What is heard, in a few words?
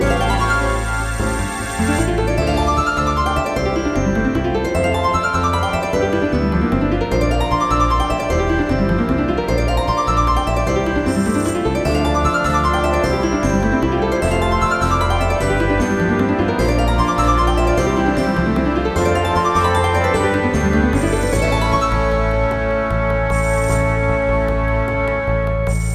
~Harp~; the legend of zelda skyward sword expanded